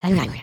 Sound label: Stunned; creatures organic; Lego; Strombie